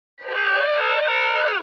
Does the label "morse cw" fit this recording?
No